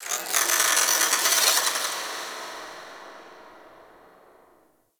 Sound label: Cephalon Tech Small, Ambience